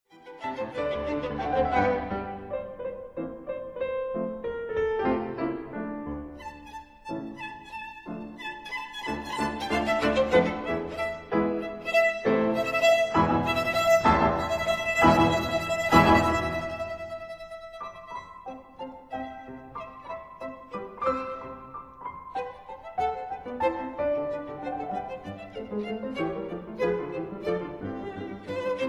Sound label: Sonata for violin & piano in C minor, Scherzo; No. 7; Eroica; Op. 30; Allegro